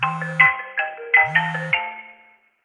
Cellphone Ring; Karen

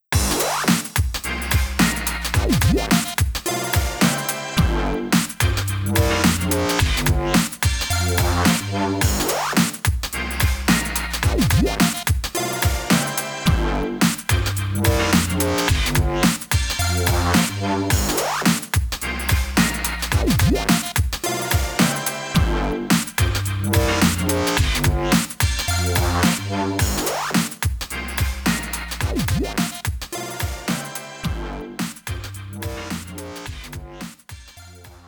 Challenge Game Over; nintendo labo toy-con 02 robot kit

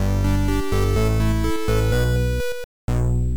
Level Start, Amiga; Solid Gold; Babylon

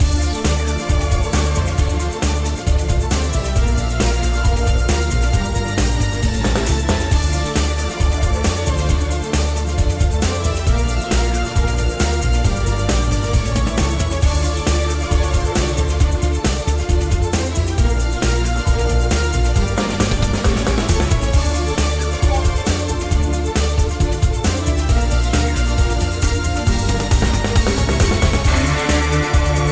LOOP Multi BALL; Garfield dmx minigame

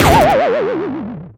Curse-Hit; Candies 'n Curses; Android, iOS

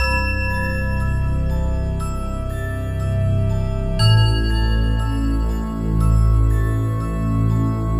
Tension; Schlag den Raab Das 2. Spiel; 3DS

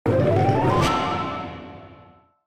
level start; Cookie Jam

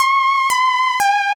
Beat

